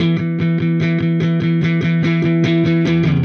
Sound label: Silos Dirty Strum Guitar, Indie Rock; Sonic Foundry (Sony Creative Software); Indie Rock Anthems; Session 11 - Silos